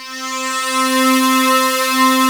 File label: SWEEP C3, RHODES CHROM; Greytsounds VOL-1